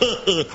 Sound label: laugh clown; ooh ahh